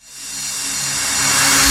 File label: Tonal FX C#